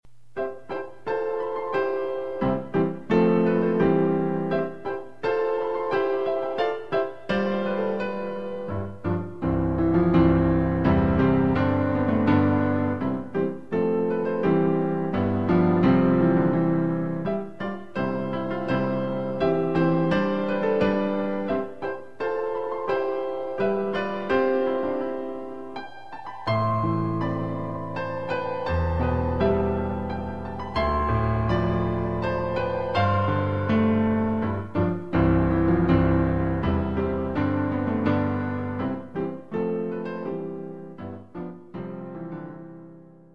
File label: Come Thou Fount Playing Piano